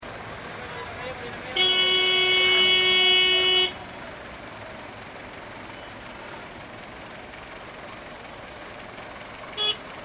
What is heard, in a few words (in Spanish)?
PARADERO BOCINAS Y CLAXONS